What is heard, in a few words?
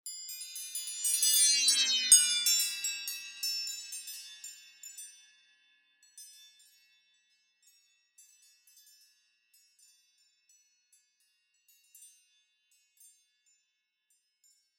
Chimes, doppler shifted ringtones ios